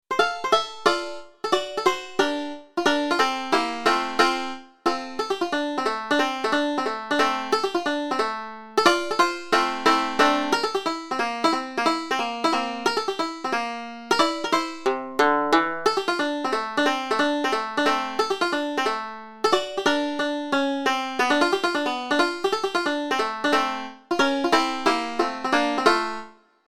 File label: Fooling the Frets